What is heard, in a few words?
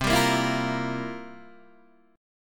C 11th, C, down, Guitar, DADGAD